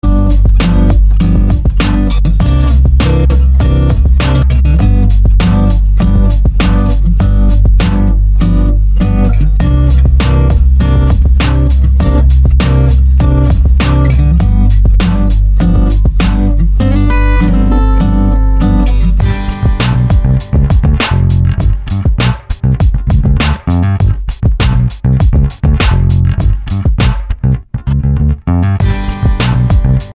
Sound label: Soft Acoustic